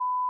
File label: bleep 1khz